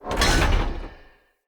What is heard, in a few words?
Weapon Rack Open; Weapon Racks